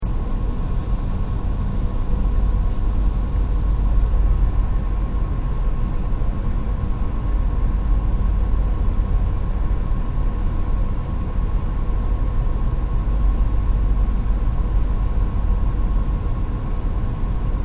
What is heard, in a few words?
Room Tone Computer Air Con; Room Tone Computer Aircon 1; Partners In Rhyme